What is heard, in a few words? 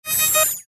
DIGITAL WARBLE; Multimedia; Sound Design; Blastwave